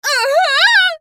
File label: dying; Janna